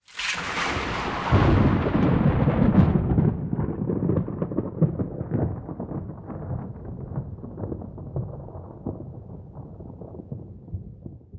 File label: Lightning Thunder